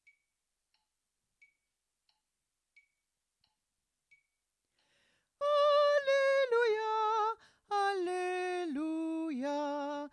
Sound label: soprano; benedicat vobis